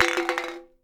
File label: Impact Can